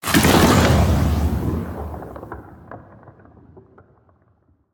Frost Bolt; SNKRX

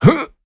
Male Jump; SNKRX

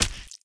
Bloody punches; SNKRX

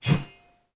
Heavy sword woosh; SNKRX

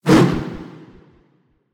Wind Bolt; SNKRX

